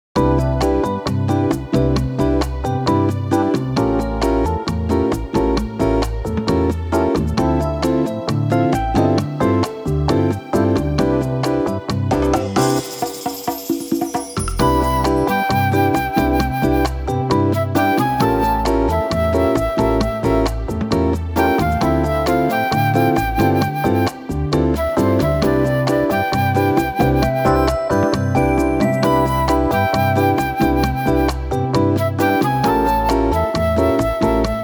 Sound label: relaxing shop